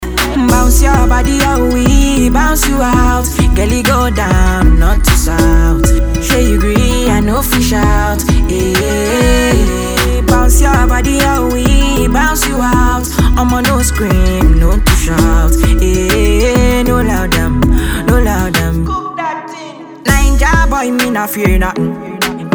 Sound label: Bounce